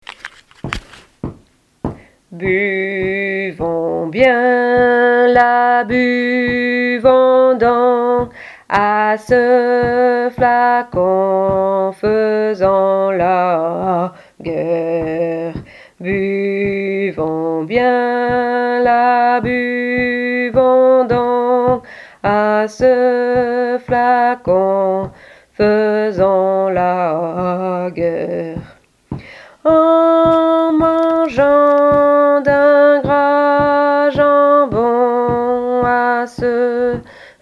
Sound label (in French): Tenor chant; Tourdion